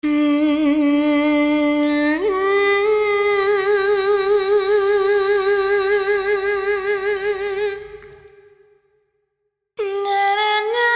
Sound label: Balinese